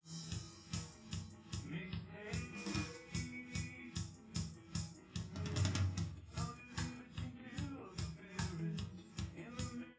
wdrums liverec 250ms 5cm sim

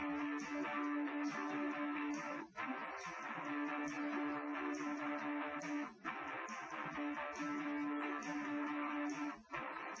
wdrums liverec; SiSEC08; SiSEC underdetermined

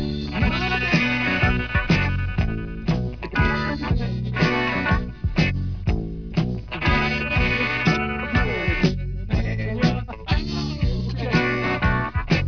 music

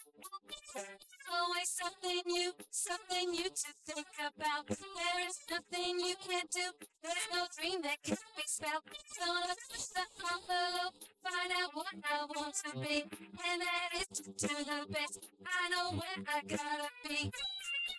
vocals; SiSEC11

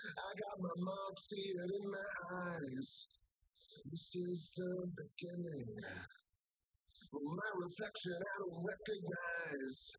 wdrums liverec; SiSEC08; SiSEC underdetermined

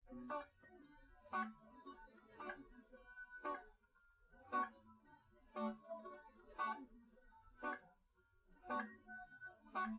test no drums live rec; SiSEC11; underdetermined

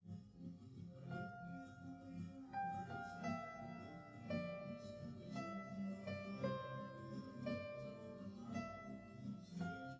no drums live rec

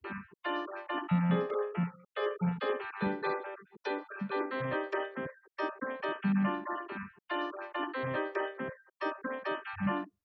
wdrums; SiSEC10; underdetermined

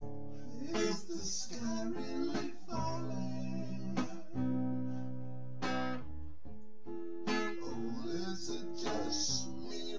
no drums, synth conv, 250ms, 5cm; SiSEC08; SiSEC underdetermined

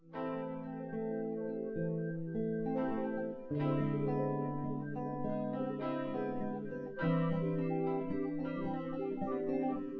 nodrums synthconv 250ms 5cm; SiSEC08; SiSEC underdetermined

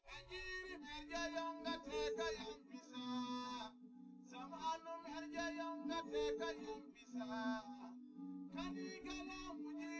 no drums live rec 250ms 1m; SiSEC11; underdetermined

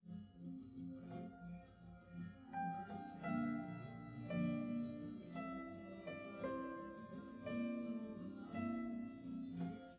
no drums live rec

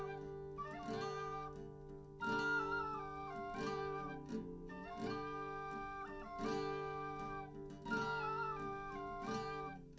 nodrums liverec 250ms 1m mix; SiSEC11; underdetermined

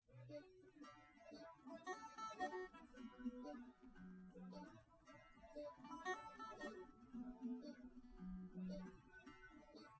test nodrums liverec 250ms 5cm sim; SiSEC08; SiSEC underdetermined

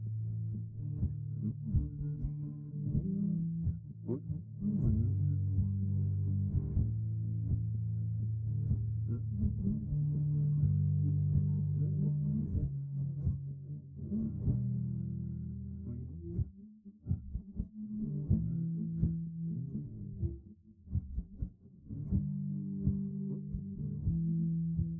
drums; SiSEC11

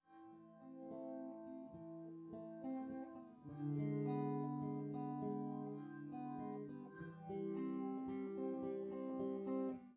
nodrums

